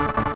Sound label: synth